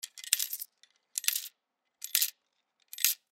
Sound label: Coin Dispenser; Coin Dispenser Insert Dimes; Money Sound Effects; Foley; Blastwave